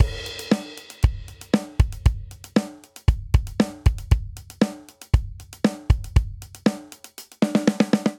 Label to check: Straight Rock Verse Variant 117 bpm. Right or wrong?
Right